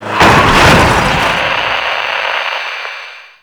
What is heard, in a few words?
Crash Post Transmission; Aoi; Warframe; NineteenNinetyNine; Stage8ShitboxConvoy